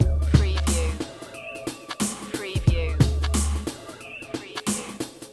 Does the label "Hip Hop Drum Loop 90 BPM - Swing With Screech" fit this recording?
Yes